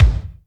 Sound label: BD, DR BD; Best Service Gigapack 2